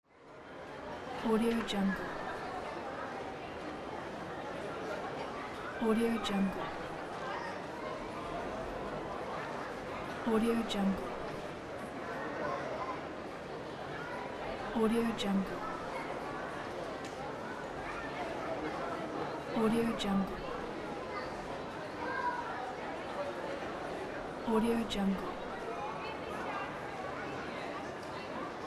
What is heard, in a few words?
people noise